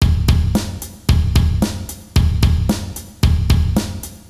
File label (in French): Rythme